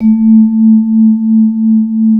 MLT VIBE

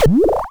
Cwejman S1; Norler; DevSnd Cwejman S1 - RD

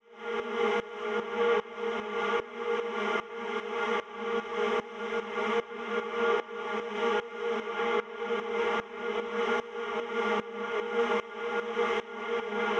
VOCAL BED A#

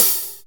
FX Cymbals, Percussion; ohh; Dance Synths

